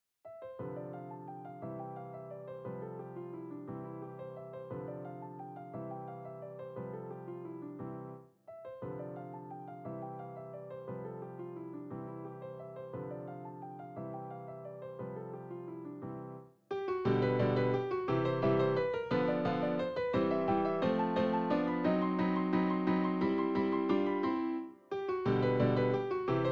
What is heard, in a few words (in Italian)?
Contraddanza versione per piano, do maggiore; Contraddanza per orchestra in do maggiore WoO 8 numero 1